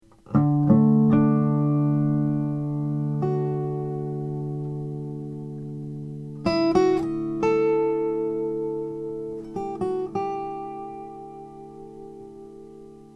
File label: mcpherson travel camo